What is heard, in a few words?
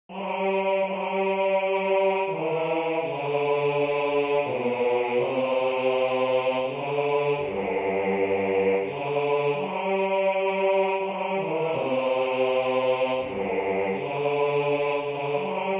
bass; while shepherds watched their flocks